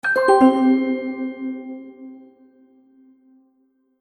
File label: Magic Purchase Ding; Cartoon Sound Design